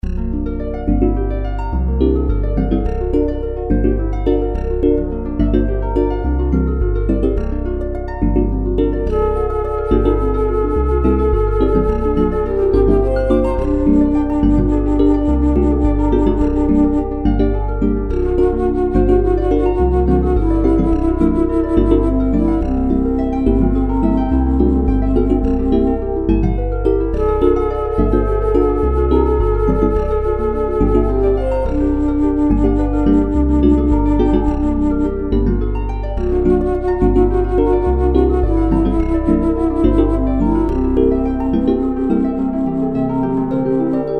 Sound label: Trumpet Song